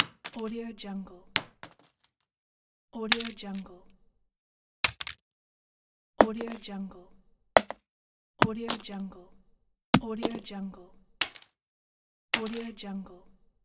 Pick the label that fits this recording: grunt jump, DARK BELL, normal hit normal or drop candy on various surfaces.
drop candy on various surfaces